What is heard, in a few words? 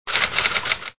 Typing